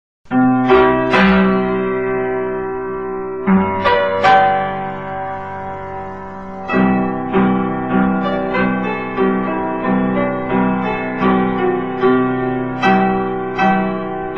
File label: piano